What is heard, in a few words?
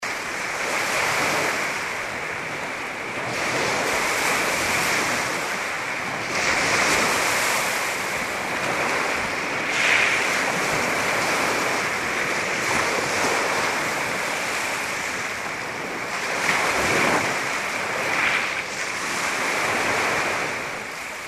Lake Superior Shore; lake superior shores